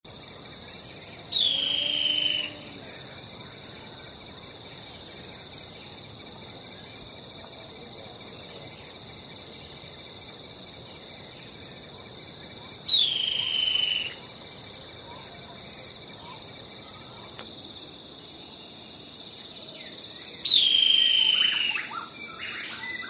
Lepidocolaptes souleyetii Trepatroncos Cabecirrayado